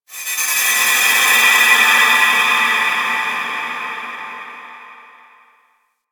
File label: Moon Texture Small, Ambience; Warframe; Orokin